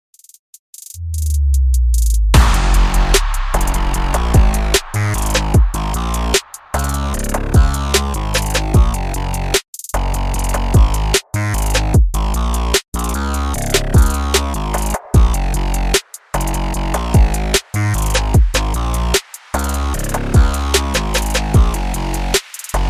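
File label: drum and bass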